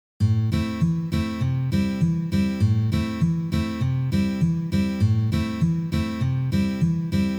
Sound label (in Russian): Akkompanement na gitare Bas shhipok